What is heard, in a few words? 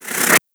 Player Resurrect End; Warframe; Tenno